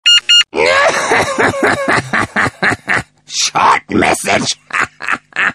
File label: Short message